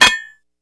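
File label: ricochet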